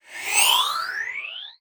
Multimedia Internet CD-Rom Flash Whoosh

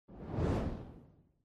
Fireball; RODECaster PADS; UNIFY

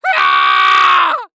Cartoon Human Male Scream Leprechaun Scream

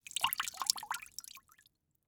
Liquid Water Dripping In Metal Bowl

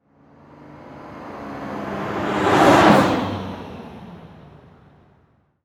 Truck Ford Ranger Drive By Fast Distant; transportation

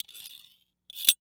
Emergency Handcuffs Open Close; emergency effects; AdobeCC

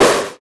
Multimedia Internet CD-Rom Flash Blast

